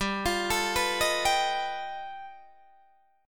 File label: G Augmented 9th, Guitar, Open D